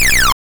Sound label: shoot, attack sound; カタナ１